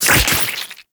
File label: Splitter Gore Spew; Warframe; Infested; Grey Strain